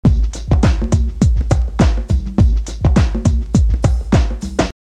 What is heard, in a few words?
drum loop